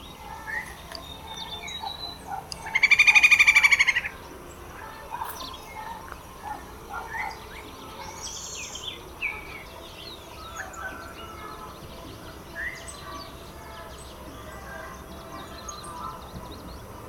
Chondrohierax uncinatus, roofvogels; Langsnavelwouw; Accipitrinae; Accipitres; Accipitidae

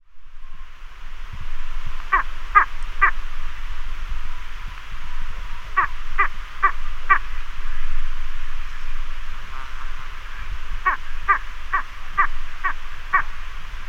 Aquila adalberti; Spaanse keizerarend; roofvogels; Accipitrinae; Accipitres; Accipitidae

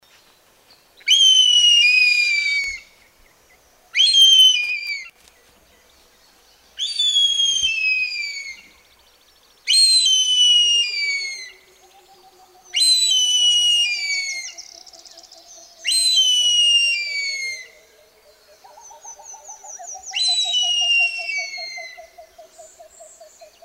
Polyboroides radiatus, Holenkiekendief; roofvogels; Accipitrinae; Accipitres; Accipitidae

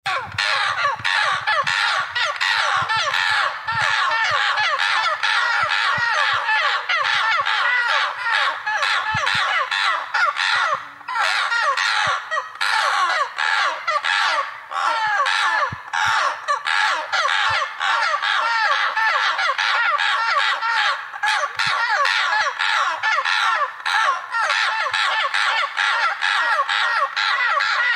Ibycter americanus, Roodkeelcaracara; roofvogels; Falconidae; Falconae

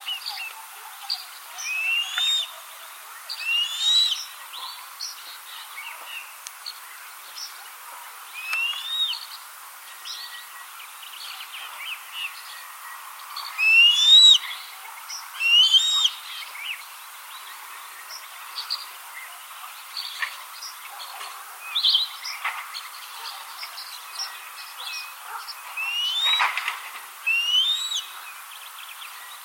Polyboroides typus, roofvogels; Accipitrinae; Afrikaanse kiekendief; Accipitres; Accipitidae

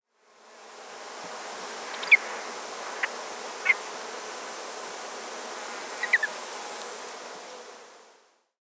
Aquila audax, Wigstaartarend; roofvogels; Accipitrinae; Accipitres; Accipitidae

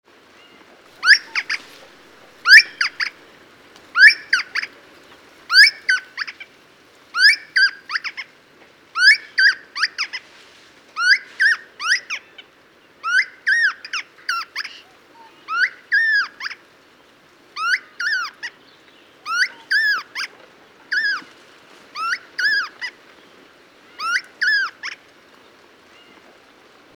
Polihierax insignis; Birmeese dwergvalk; roofvogels; Falconidae; Falconae

